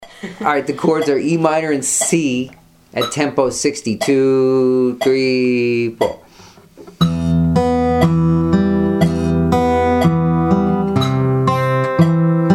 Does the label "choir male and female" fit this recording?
No